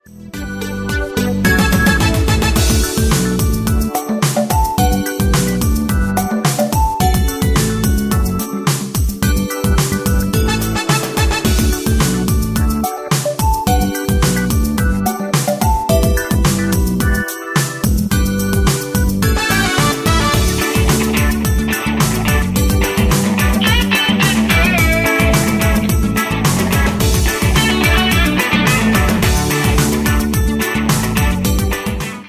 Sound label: Luga karaoke